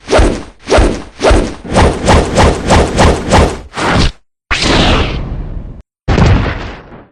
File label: breakdown full; Mamemomi